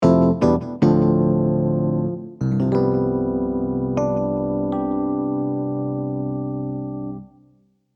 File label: jingle